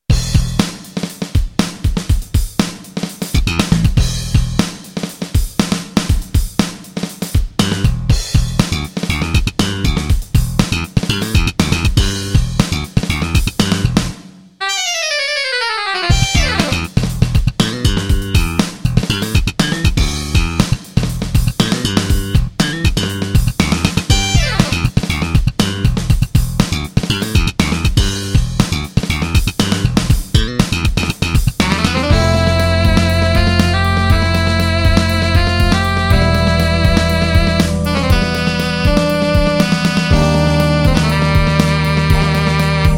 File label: sax